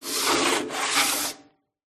industrial metal drum cleaning scraping sanding grind